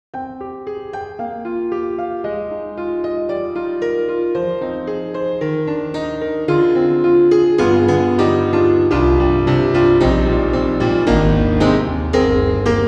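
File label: Concert Hall, piano; Native Instruments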